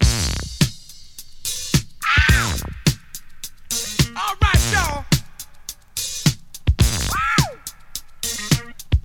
106 bpm fresh drum beat d sharp key

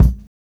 steel kick drum a sharp key

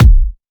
kick b key

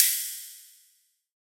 ambient trap open high hat sound f key

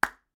pop sound

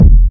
raw steel kick drum sound e key; kick drum samples

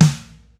bassy steel snare drum sample d sharp key

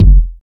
hot kick sample F key; kick drum samples

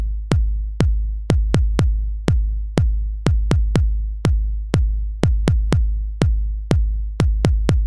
House Lo-Fi Punchy Clincky KIck loop 122bpm; drum loops

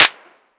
hand clap sound E key; percussion; single hits one shots

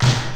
old school original acoustic snare sample c key

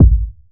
kick drum sound g sharp key

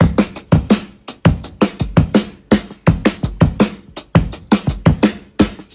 84 bpm drum groove A key; drum loop

